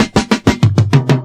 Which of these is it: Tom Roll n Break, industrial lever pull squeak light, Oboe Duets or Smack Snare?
Tom Roll n Break